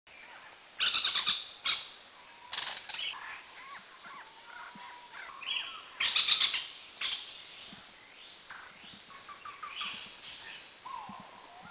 Orange-breasted Trogon Alarm Song Cuckoo Khao Yai, Thailand